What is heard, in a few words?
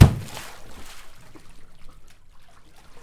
water slap; E-MU Producer Series Vol. 3 – Hollywood Sound Effects; Water Slaps